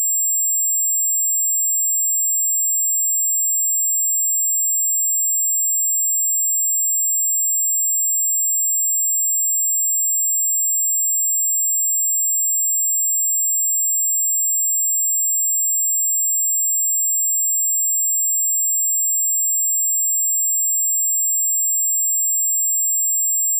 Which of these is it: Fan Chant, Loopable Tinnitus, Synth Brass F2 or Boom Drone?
Loopable Tinnitus